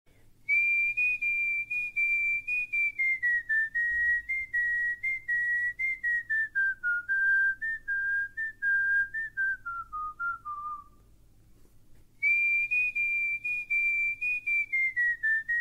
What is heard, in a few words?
whistle bgm; Om Shanthi Om; srk; deepika padukon